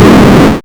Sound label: explosion; RocketPatrolMods